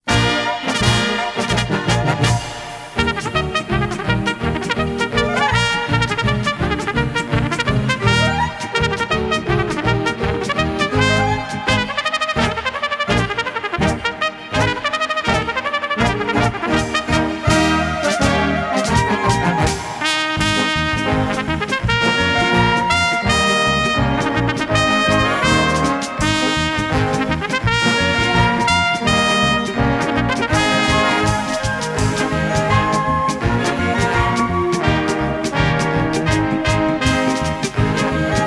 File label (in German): Trompeten